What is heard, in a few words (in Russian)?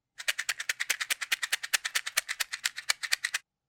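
звук спичек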